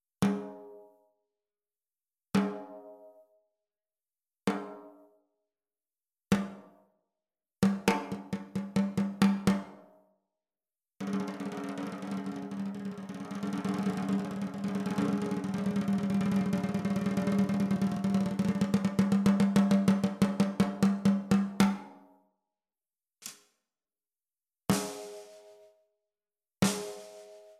ODERY IMBUYA 13X7, CAISSES CLAIRES

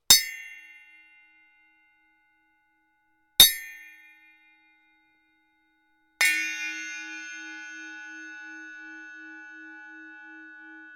FX ZILDJIAN ZIBELL LARGE 9.5, CYMBALES

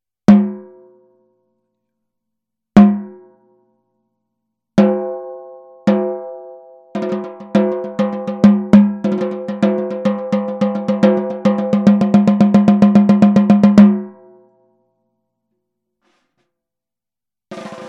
TAMA PM206 14X65, CAISSES CLAIRES

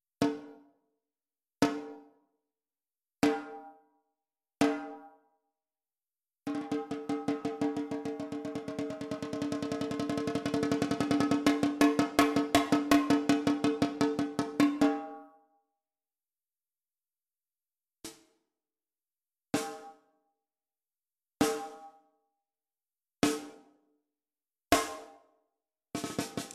DIXON ERABLE 10X5, CAISSES CLAIRES

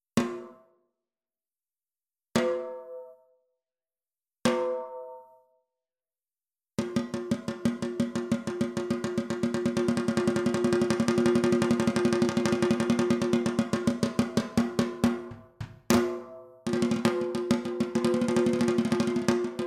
PEARL MAPLE 12X7, CAISSES CLAIRES

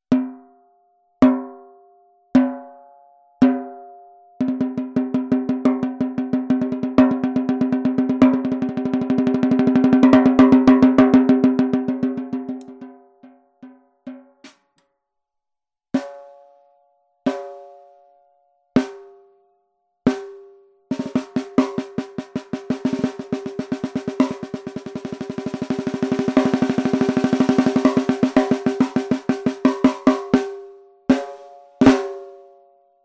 TAMA METAL 10X65, CAISSES CLAIRES

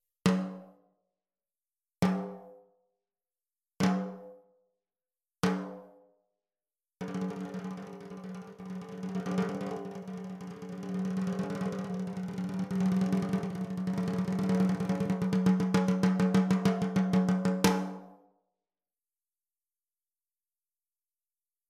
GENISTA 12X7, CAISSES CLAIRES

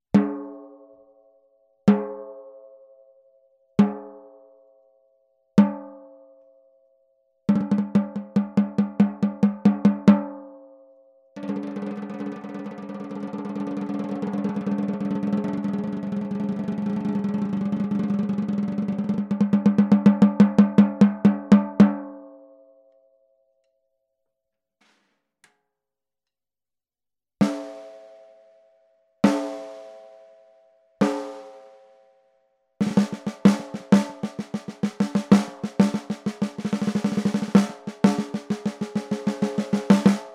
LUDWIG PIONEER 14X5, CAISSES CLAIRES

